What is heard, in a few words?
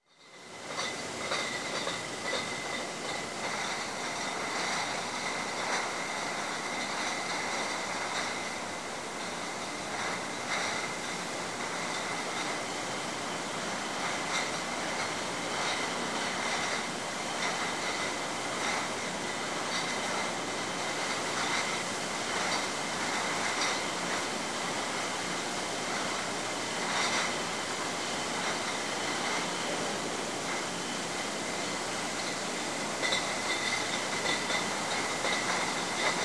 Bottling Plant General Ambience Of Bottling Plant; Sound Ideas 2000 Series